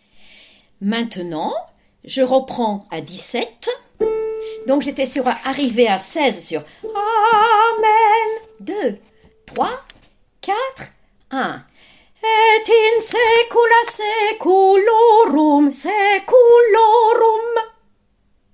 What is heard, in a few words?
Allegro Alto